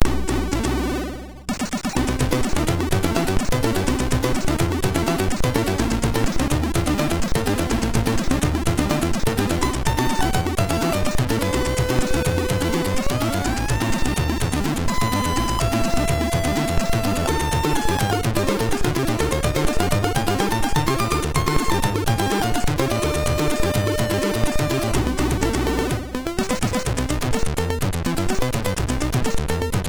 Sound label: Ballad, MOS6581R2; Thorhilds Ballad